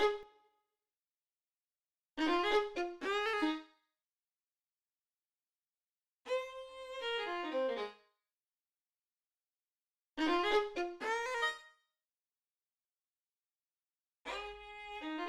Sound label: melody; game builder garage 2021 switch